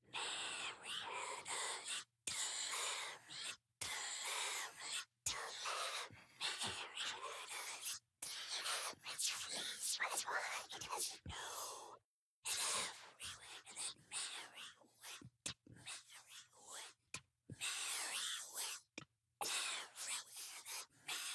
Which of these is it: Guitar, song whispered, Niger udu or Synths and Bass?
song whispered